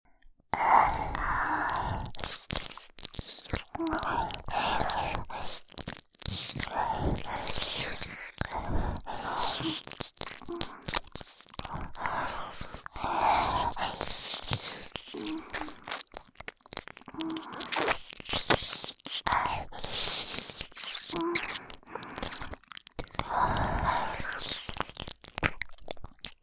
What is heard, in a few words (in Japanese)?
両耳舐め